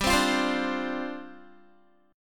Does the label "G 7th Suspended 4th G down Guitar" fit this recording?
Yes